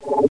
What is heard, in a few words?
Button Sound